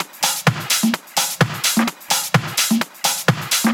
Latin House Loop 128 BPM; Vengeance Samples

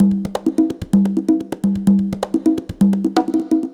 Percussive Loops 128BPM; Vengeance Samples

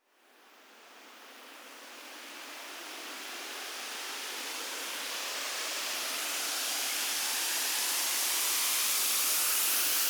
Restart Kit 135BPM Sweep Fx, F# minor; Sound FX

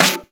Snare; Vengeance Samples

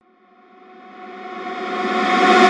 Tonal FX F#

